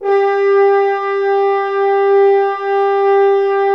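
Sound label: BRS, French Horns Dry f; Roland L-CDX-03 Disk 2; F.Horns 2 f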